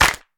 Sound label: wet clap single hit E key, clap sound tuned to the E note. Loudest frequency: 2630Hz; single hits one shots